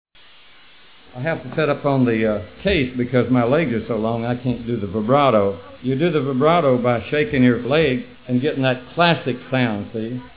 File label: musical saw intro; homer ledford